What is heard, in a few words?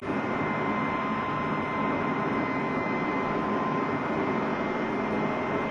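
turbine rpm high interior, tanks; engines; War Thunder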